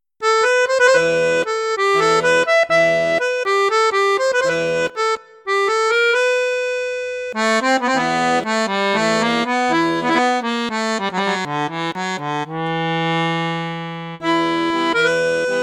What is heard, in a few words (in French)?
registre basson, accordeon; cavagnolo professionnel 12